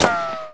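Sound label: gun concrete impact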